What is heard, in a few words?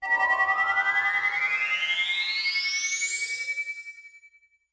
Fairy